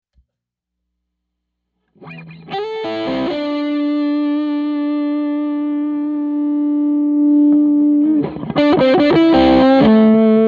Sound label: 335 OD Thick; Trinity OSD Amp; ODS